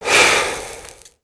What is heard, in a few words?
Fire Extinguish